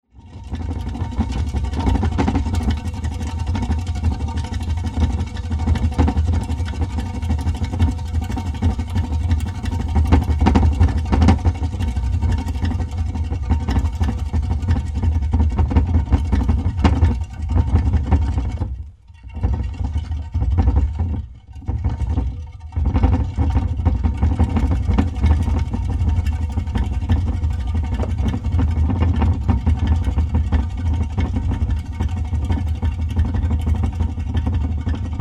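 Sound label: sounds of hamsters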